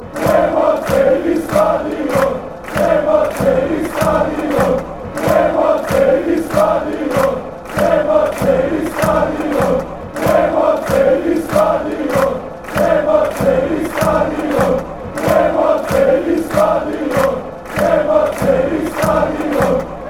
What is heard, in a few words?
slovenia chant, fanchants